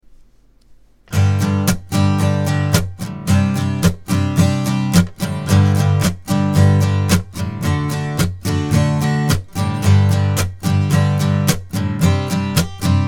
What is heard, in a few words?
Chord Inversions, guitar